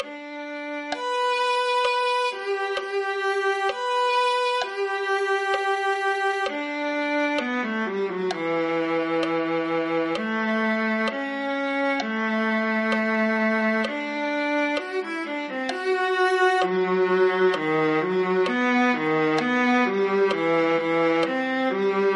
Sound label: viola